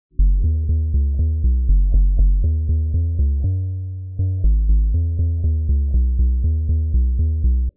christmas tree drum small

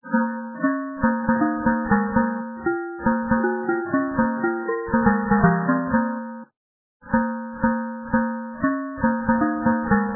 sticks

